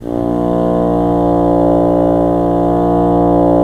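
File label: wind bassoon; LA Composer vol. 3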